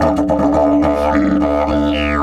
108, DIDGE 108BPM

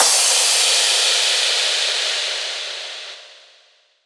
Crash, Cymbals

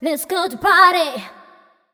f mel f, fem Vocals; Mutekki Media; Diamond Vocals 2

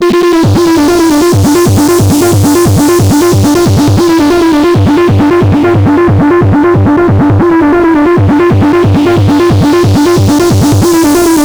BURN FILT; Spectrasonic Distorted Reality 1

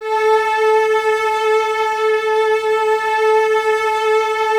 String Ensembles Cellos A 4; Miroslav Vitous; 90 s Sample CDs

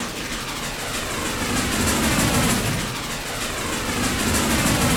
ACID TRAIN 194; D LOOPS; MIXED D LOOP

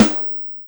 Maple Rim, Yamaha DTXpress Acoustic Snare

